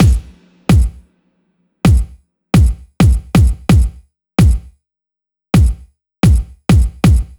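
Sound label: Bass Drum Loop, 130bpm; Zero-G; Soundclash Nu Dance Electro; Construction Kits; Feet First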